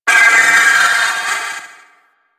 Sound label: Trooper Vocal Death; Warframe; Sentients